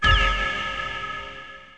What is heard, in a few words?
gong; SGI IndiZone 2; pillars